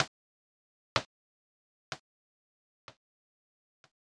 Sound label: Tick Tock; Spindizzy Worlds; SNES